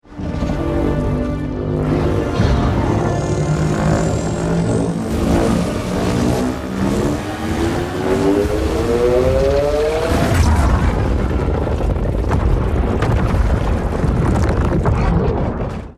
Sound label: atomic breath